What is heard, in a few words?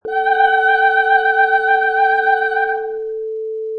Female Operatic "La"